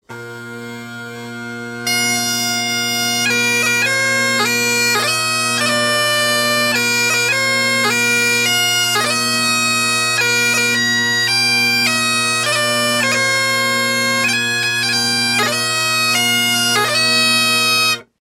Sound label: happy birthday, bagpiper